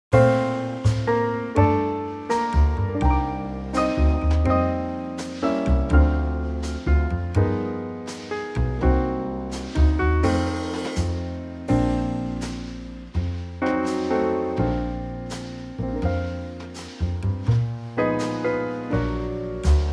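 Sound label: Bb, Backing Tracks for Male Vocals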